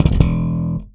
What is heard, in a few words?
Slap One Shot E, Bass; Bass X